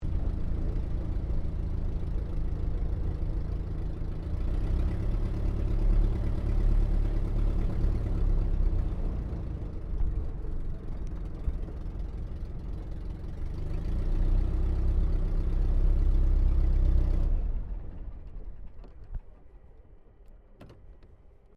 AIRCRAFT DH82A DEHAVILAND TIGERMOTH TAXI SHUTDOWN INTERIOR; RAAF Tigermoth; Airplanes; Vehicles